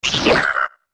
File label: Gekko Hit; Gekko getting hit